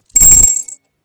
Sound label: break